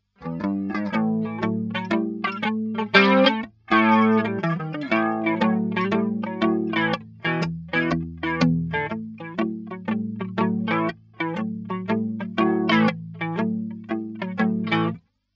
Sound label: flanger